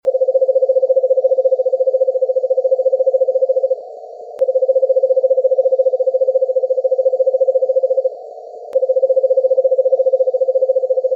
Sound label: Vermiculated Screech Owl; Richard Hoyer